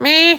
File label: meeeeh